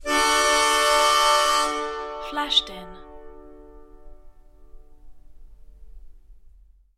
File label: big harmonica